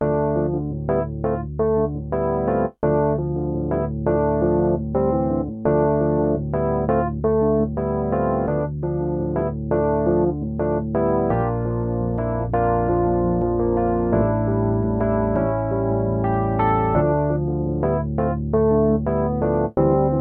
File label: Electric Piano